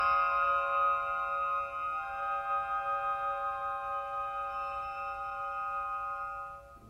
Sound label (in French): tenue harmonique